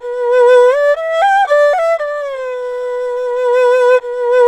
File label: ERHU, 120; G; INIS; Opium